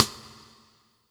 stick; Funky Ass Loops; East West

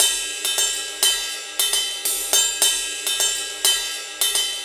Ice Cymbal Bell Riff; Sonic Foundry (Sony Creative Software); Joe Vitale Latin Percussion

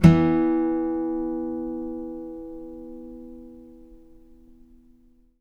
5ths E3, Blues Guitar; ILIO; Fretworks

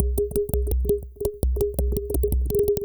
Hi Dirty Click Beat; Ultrabeats

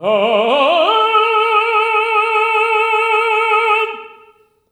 TENARP A3, tenor arp, voices

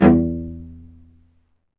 Low Marcato; Roland L-CD702; Combos 1

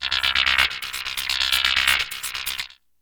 SYNTH LOOP; E-MU Formula 4000 Series Vol. 2

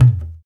Tablas; Spectrasonics; Supreme Beats; World Dance

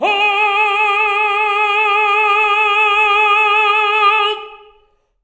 TENOR G#4, Voices; Spectrasonics; Symphony of Voices

